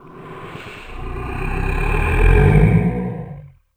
SFX -R, Drum & Bass; Ueberschall; Drum & Bass Resonance

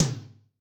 AC Tom L, Yamaha DTXpress-II

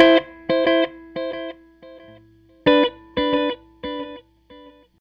Strat Guitar Loop

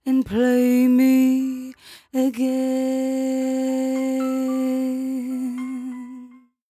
57bpm Am, Velvet Vocal; Zero-G

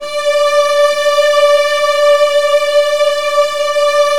D3, Virtuoso Strings; ILIO; Kirk Hunter Virtuoso Strings VOL-2

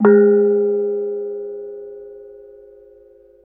KENONG; Spectrasonics; Heart of Asia; KENONG PH

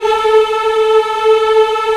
VOX AFMM, VOX Afro Mms, Africa

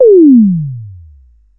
TOM KIT D#4, TOM SYNTH; AKAI; USB Soundscan vol.20 - Fresh Disco House I

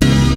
HIT, STABS, House

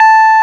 PUNCHREEDS; Wizoo - Powered DX

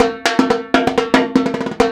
Percussion Loops, Brazilian; Houseworx

